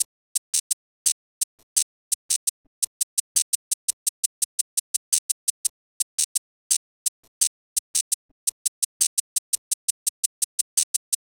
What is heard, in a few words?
Shakes 85, Percussion Elements